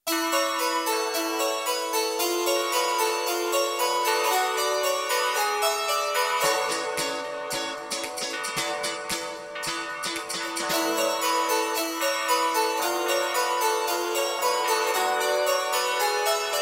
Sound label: Jingle Bells, hoa tau